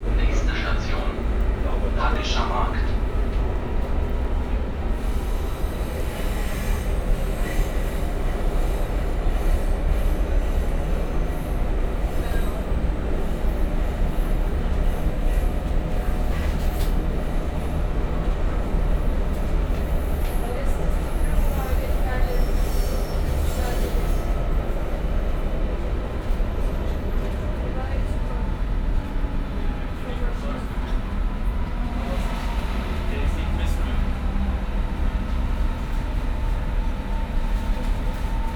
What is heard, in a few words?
S Bahn Berlin; Sound Scapes